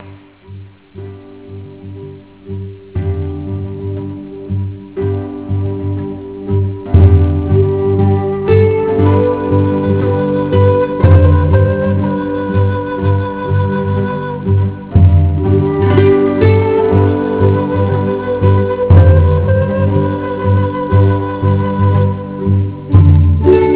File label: drumboy